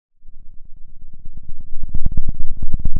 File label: pop, Sound of a thunderstorm